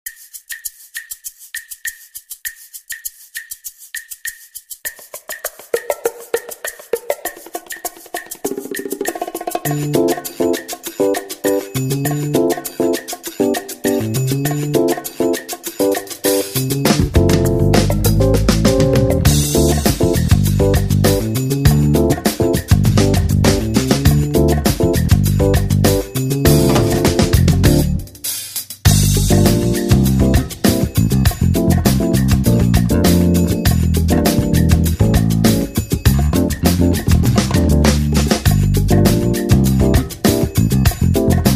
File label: backing track